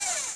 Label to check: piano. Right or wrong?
Wrong